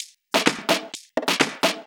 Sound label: Houseloops 128BPM; Vengeance Samples